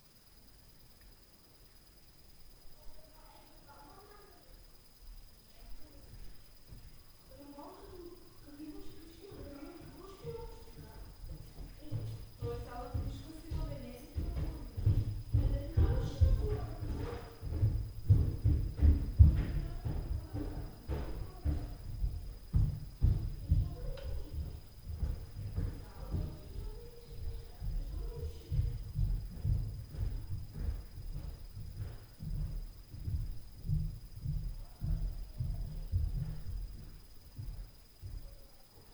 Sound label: STAIRS NOISE, Night Noise